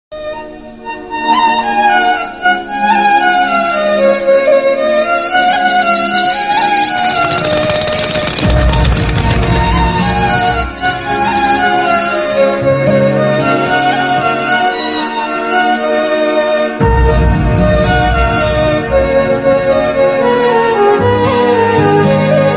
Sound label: BGM Instrumental Tamil Song Ringtones; Mrugaraju